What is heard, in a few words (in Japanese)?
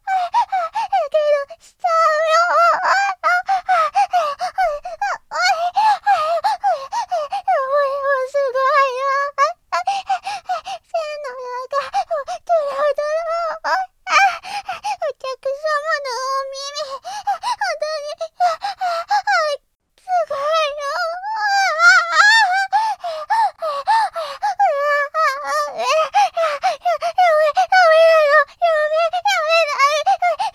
喘ぎスパイス！